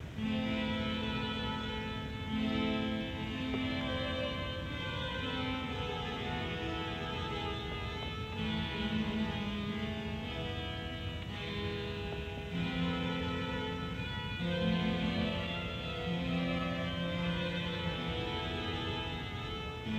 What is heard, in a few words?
Harpsichord and Piano